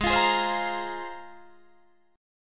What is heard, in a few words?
G sharp 6th Add 9th, Guitar, Open D